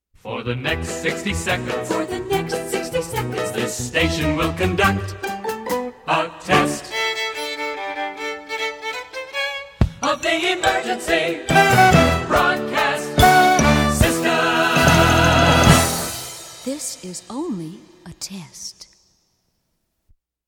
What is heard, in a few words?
Singing; EBS Test Open